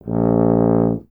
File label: tuba A1, Tuba; Miroslav Vitous - Solo Instruments VOL-2